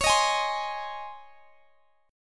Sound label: C sharp Major 7th Suspended 2nd, C sharp; C#M7sus2; strummed